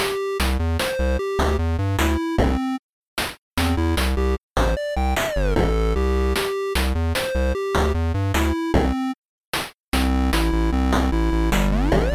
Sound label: short chiptune